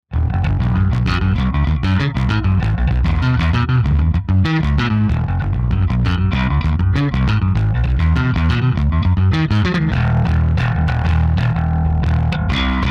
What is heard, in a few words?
studio bass